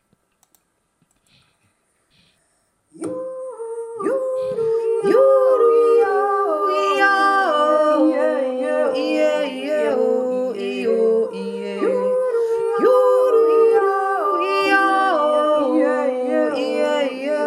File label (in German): pygm en draistimmig